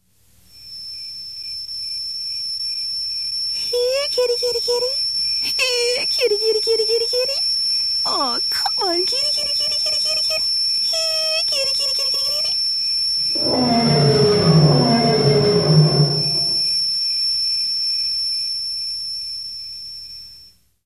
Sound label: Kitty Kitty; Halloween; Hallmark Halloween 1989